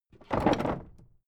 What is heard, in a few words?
old wood case wobble